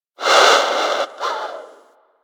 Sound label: Bird Snore; Warframe; Hunting Critters; Sawgaw